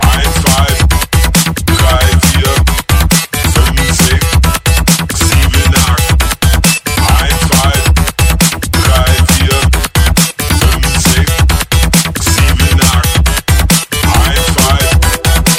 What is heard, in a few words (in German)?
Polizei, ringtones